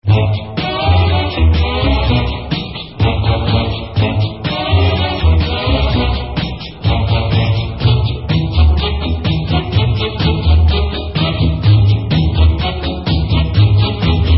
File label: American Instrumental